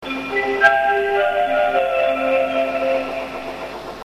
bus chime; 中国ＪＲバス車内チャイム２（雲芸南線）

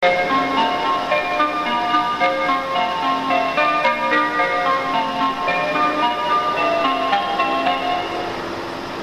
sotetsu, bus chime; 相鉄バス; 横浜と河口湖を結ぶ高速バス『レイクライナー』のチャイムです。; 共同運行のフジエクスプレス（富士急行）でも横浜発着で同じチャイムが流れますが、相鉄バスは全放送で流れます。

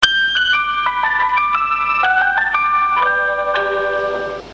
bus chime; 防長交通バス; 高速バスのチャイムです。チャイム１は発車後

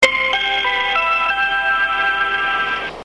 kanto bus, chime; 関東バス; こちらは武蔵野営業所の一般路線バスで、２０１３年２月１５日の切り替えで導入された終着放送のチャイムです。

